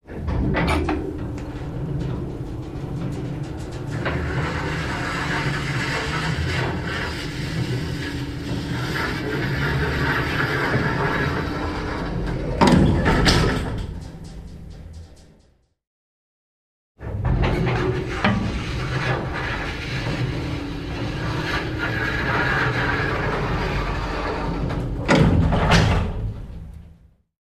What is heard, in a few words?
Elevator Old, Sliding Door, Wooden; old elevators; Arrive X2